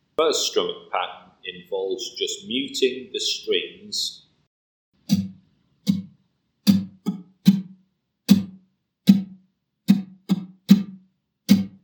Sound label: strum